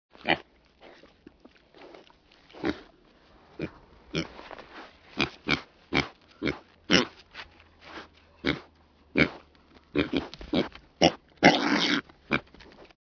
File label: Pig grunting sound